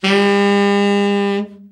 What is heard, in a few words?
BRA TENOR OB, SAX